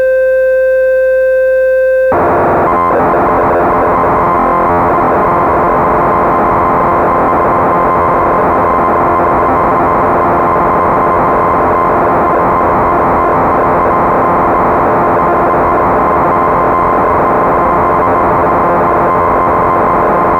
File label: Crumar Bit One